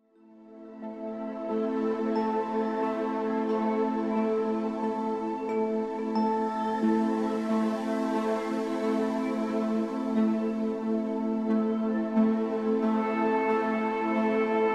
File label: Relaxing